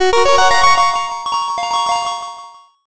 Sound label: jackpot